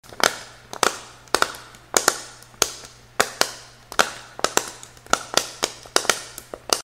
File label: Puppet Fidget